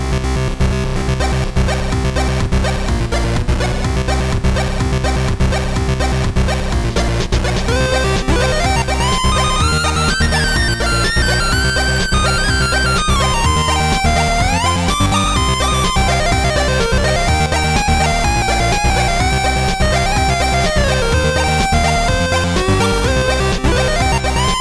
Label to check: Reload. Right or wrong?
Wrong